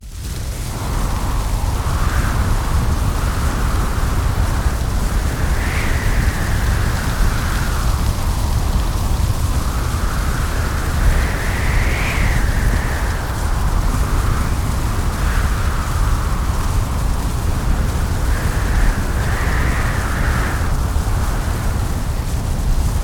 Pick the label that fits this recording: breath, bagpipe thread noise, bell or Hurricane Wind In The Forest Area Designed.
Hurricane Wind In The Forest Area Designed